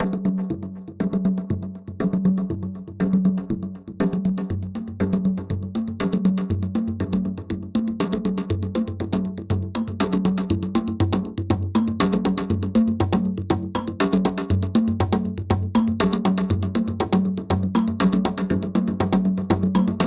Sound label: Drumming